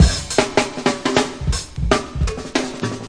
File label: 160 ruffneck rolls, drum loop; 141 to 160 bpm